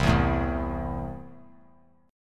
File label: C 6th C down, chord; C6 strummed